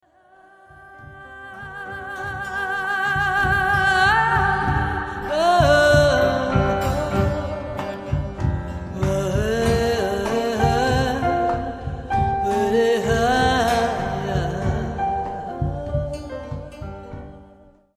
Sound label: blues